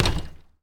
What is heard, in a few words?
Street Ball Glass Back; SFX Kit